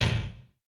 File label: Blaster Bolt Hit